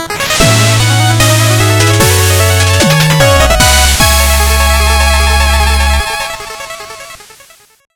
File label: Boss Victory; Shovel Knight Specter of Torment OST Stereo Edit